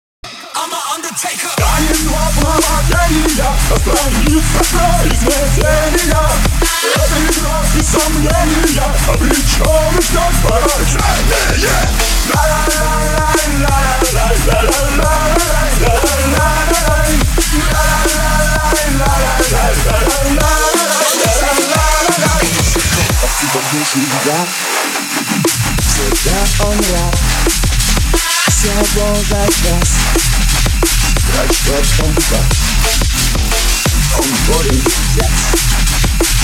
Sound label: DNB